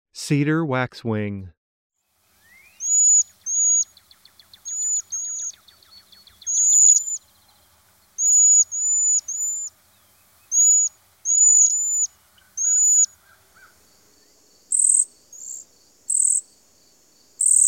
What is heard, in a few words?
cedar waxwing